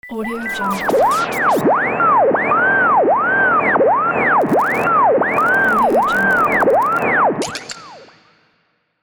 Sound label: radio glitch failure